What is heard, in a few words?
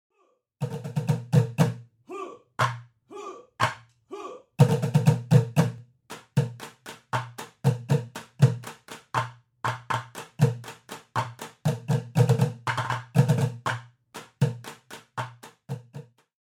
paradiddle